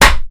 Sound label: wood plank impact hard; Source Engine; video game; physics